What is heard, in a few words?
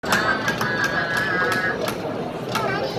clapping